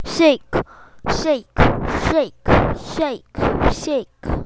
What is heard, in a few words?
shake